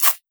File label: rip; townengine